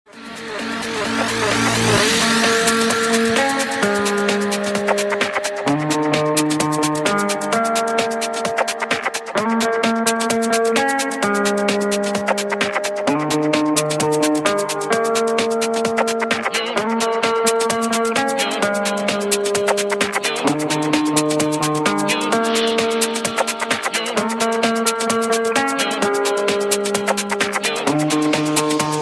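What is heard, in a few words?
House Guitar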